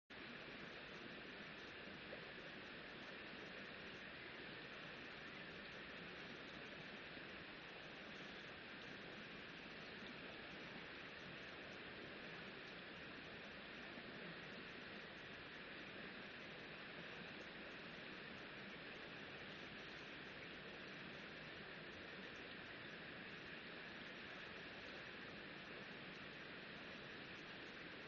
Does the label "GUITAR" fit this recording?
No